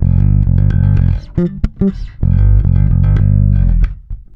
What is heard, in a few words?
NASTY G, bass; Spectrasonics; Bass Legends